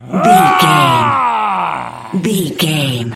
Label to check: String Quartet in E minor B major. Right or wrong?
Wrong